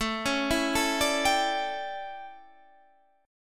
A 11th, guitar chordshape

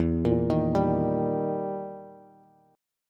E Minor Major 7th Flat 5th, Bass; arpeggiated; 12,13,13,12; JGuitar

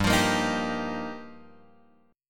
G Minor 7th Sharp 5th, Guitar, Drop D

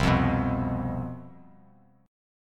C Major 7th Suspended 4th, C, down, chord; strummed

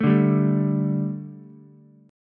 D Suspended 4th D, Ukulele; Dsus4 strummed; JGuitar

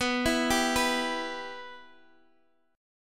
B Suspended 4th Sharp 5th, chord; arpeggiated; 19,19,17,16,x,x

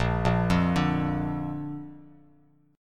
A sharp Major, down, Bass Standard; arpeggiated; chord; JGuitar

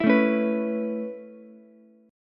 A Major Add 9th A Ukulele C6, chord; Aadd9 strummed; JGuitar

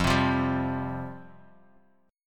F Suspended 2nd F; Fsus2 strummed; JGuitar

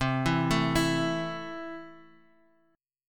C 6th C down Guitar Open F; arpeggiated

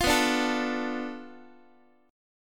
B-Minor 7th Flat 5th B down Guitar; Bm7b5 strummed; x,x,8,9,6,8; 4A,4E,5A,5D,5G#,6C#; JGuitar

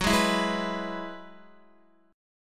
Gb 7th Suspended 2nd Suspended 4th, Guitar; Gb7sus2sus4; strummed

